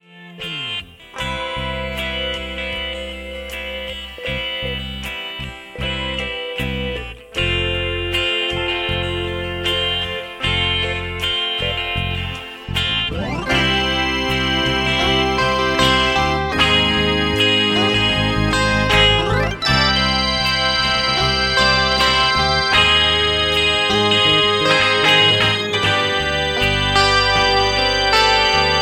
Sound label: Ringtone